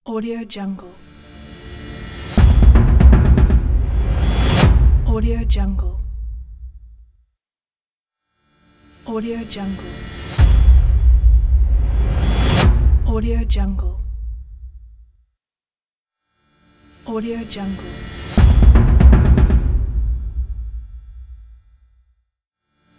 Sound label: cinematic logo reveal